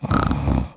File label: Snort; Submarine